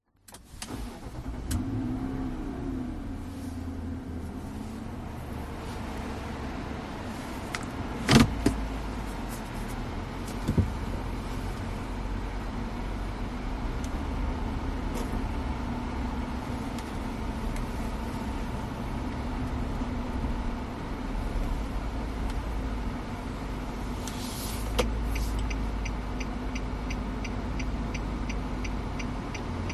vehicles car nissan patrol 2020 start wait truck pass indicate pull away fan on